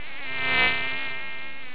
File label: mosquito; Master Clips Email Animator